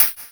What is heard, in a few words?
PERC, Drum & Bass; Ueberschall; Drum & Bass Resonance